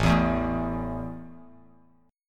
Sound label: A 5th A down Bass Standard; strummed